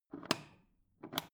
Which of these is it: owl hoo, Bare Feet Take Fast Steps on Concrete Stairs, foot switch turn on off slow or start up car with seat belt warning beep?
foot switch turn on off slow